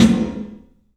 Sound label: CLUB SN VRB, 3D Drums; 3D Sound